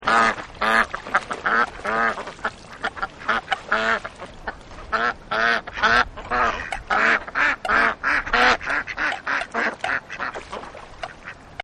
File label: Duck Pond, Nature Scenes; Environmental